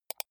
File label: computer mouse wheel click fast soft